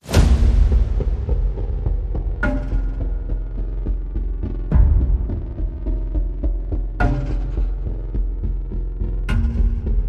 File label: Rhythm